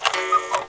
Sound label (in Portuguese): relogio, Sons domésticos; Domínio público